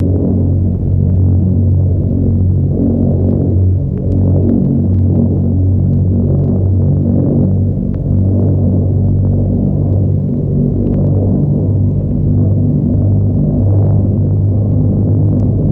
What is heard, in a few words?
B17 Bomber Squadron C, flying fortress